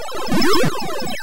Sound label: Eating ghost